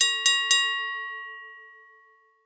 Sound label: auction start; Quentin C's Gameroom